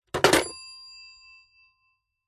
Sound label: household telephone old fashioned rotary style set down bell ringing tail; zapsplat